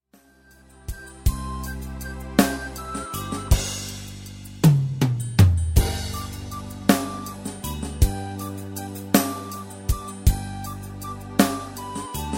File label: irish tin whistle